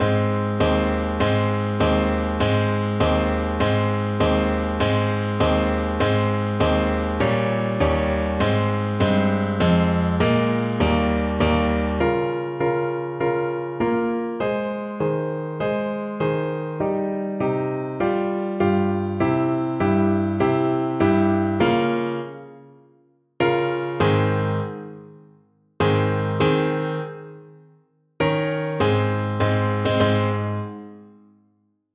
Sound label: Tenor Saxophone